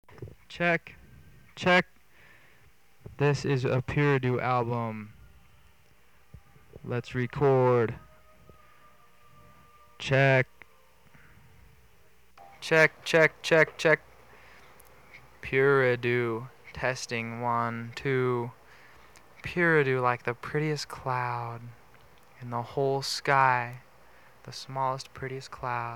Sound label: fill